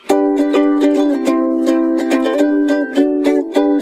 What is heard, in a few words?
uke; dan